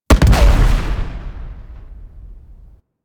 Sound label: Bow Projectile Explosion